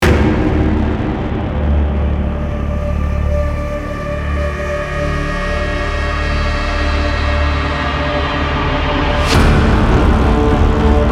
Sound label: drum